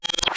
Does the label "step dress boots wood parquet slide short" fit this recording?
No